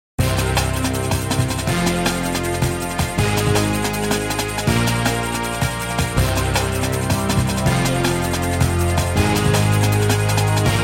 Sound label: kick track; nfs